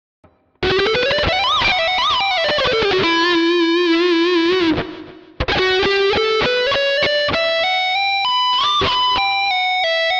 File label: shred